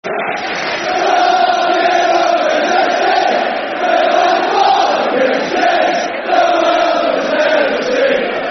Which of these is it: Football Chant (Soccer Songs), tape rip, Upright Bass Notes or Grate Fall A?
Football Chant (Soccer Songs)